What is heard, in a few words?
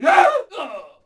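Riflemen Die Vox; Civ4 warlords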